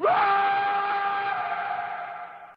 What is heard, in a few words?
wwaaaaahhhhhhhh; voice